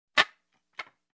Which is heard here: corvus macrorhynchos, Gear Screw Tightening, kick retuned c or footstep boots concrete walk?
Gear Screw Tightening